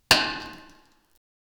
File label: pickaxe hitting stone blo; pixel art